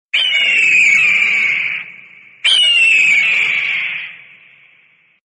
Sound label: eagle hawk voice natural